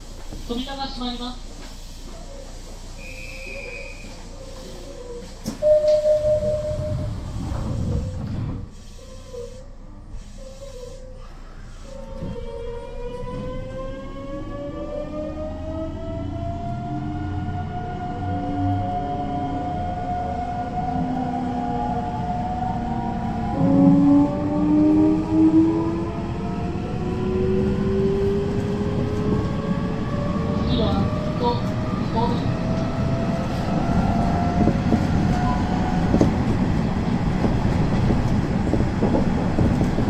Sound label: vvvf, mitsubishi; nishitetsu 6355